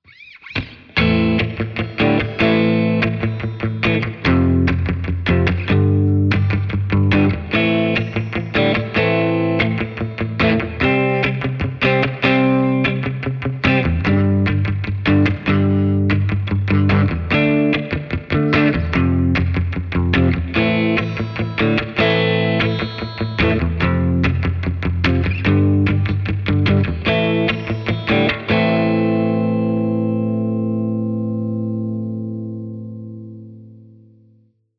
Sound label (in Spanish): Ritmica A Quemarropa